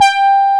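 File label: horn G4, synthesizer analog horn; AKAI S6000 CD-ROM - Volume 1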